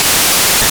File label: spray, attack sound; カタナ１